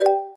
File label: collect; Stud-Rider